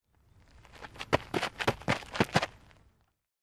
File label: Basball FS Mvmnt, baseball; baseball fx & games